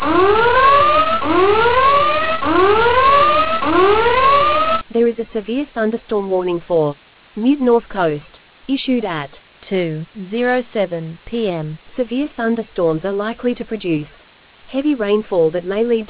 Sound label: storm audio